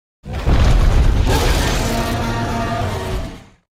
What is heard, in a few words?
anjanath roar; fulgur; overcharged